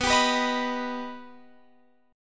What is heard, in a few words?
B Augmented B down Guitar Open F; strummed; JGuitar